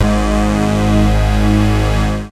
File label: bass hard; basses; lmms